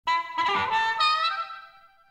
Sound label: Harmonic